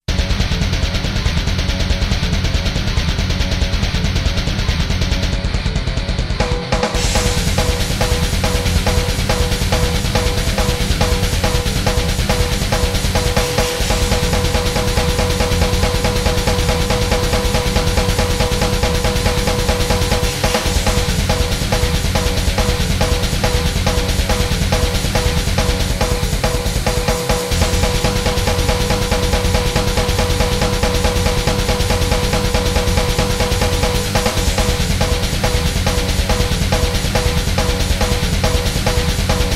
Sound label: deathmetal